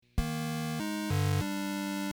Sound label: truck; truckasaurus